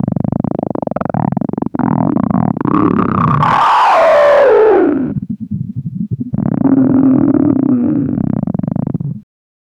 analog horror beast sound

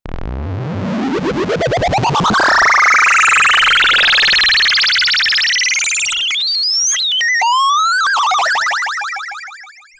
8 bit liquid success effect

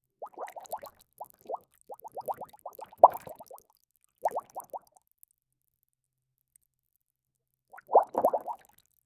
sound of a bubbling, boiling

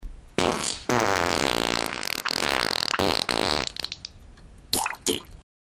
fart with water; comedy

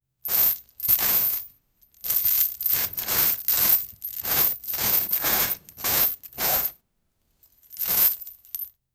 armor

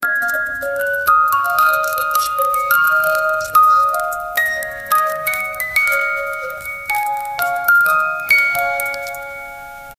old mechanical music box, lullaby

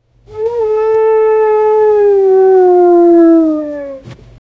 cow sound

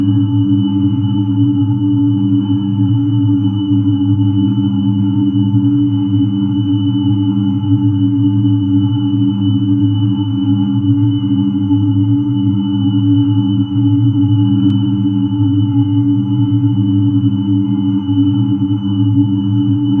inside the cockpit of a, spaceship

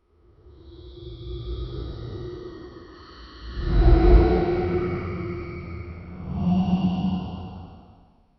a monster crawling back i, forest

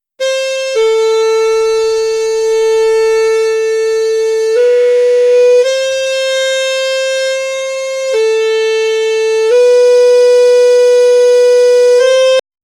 agung instrument sounds

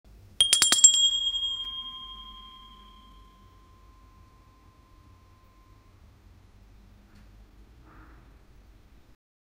bread toaster bell sound

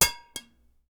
short sound of beer cap

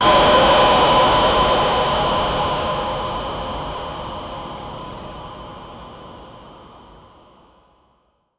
science fiction

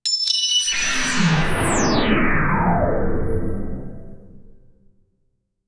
wizard; sound sprite sheet

